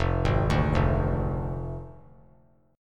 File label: F Minor 6th F down; Fm6; arpeggiated; JGuitar